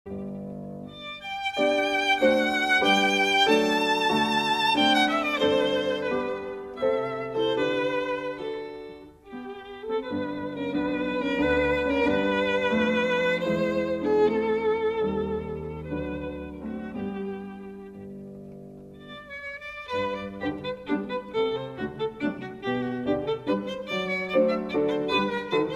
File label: minuet in G; Beethoven